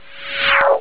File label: wzzz